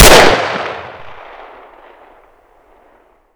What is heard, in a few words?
Inf Weapons; Red Orchestra 41-45